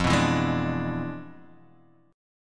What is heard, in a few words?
E 7th F, guitar; E7/F strummed; JGuitar